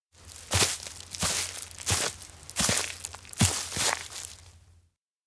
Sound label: steps outdoor ranch to horse; ken; anemoi